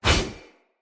Whoosh, Swords; Weapons; Mockery; Mockery Brazard

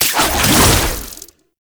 Wall Climb Dodge Start; Warframe; Oraxia

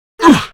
Pain, Male; Warframe; Grineer Male; Battle Barks

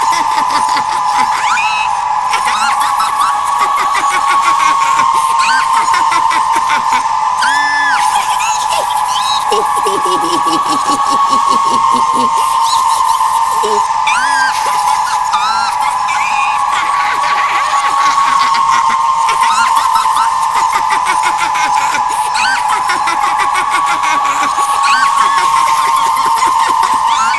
Drain Aura Loop; Dialog; Warframe; Zariman; Skitter Girl

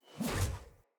Sphere Polearm Whoosh Small; Weapons; Player

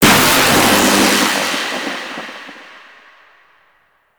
Shipyards Lightning; Exterior; Warframe; Grineer Shipyards

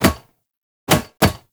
Bang On Mask Impacts; Warframe; The New War; Kahl; Foley

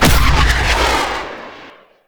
Hoverboard Jump Large C; Vehicles; Warframe; Venus Hoverboard

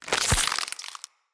Root Spider Foley Jump; Animals

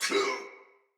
Pain, Barks Battle Soldier; Sinecure Soldier

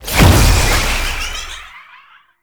Jump Pad Launch; Warframe; Shadowgrapher; Ambience

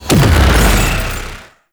brimstone cast burst; Uriel Brimstone; Warframe

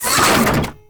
Rail Jack Door Close; Warframe; Ambience

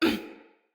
Pain Female; Battle; Envoy

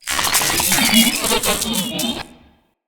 Moa Idle, Vocals

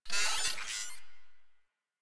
Proto Infested Mutalist Pain, Vocals; Warframe

